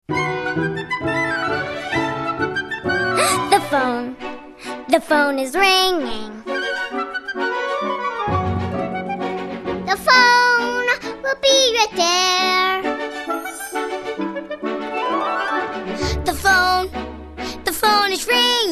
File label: Phone Is Ringing; macos online windows gamerip 2009; Nick Jr Radio